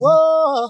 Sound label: "woah" SUNG; ooh ahh